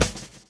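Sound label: fill; AMG Gota Yashiki Groove Activator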